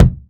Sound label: smooth steel kick drum c key